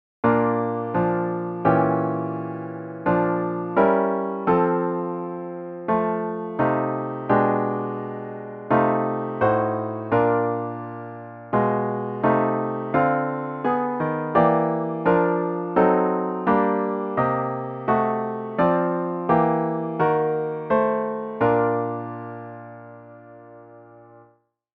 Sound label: Diminished Chords